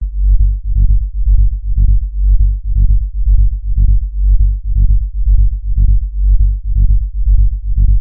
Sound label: HOOVER; MOOVER SETTING 1; Studies in MASS